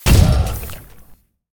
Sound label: move, battlesuit; combat; CosmicRage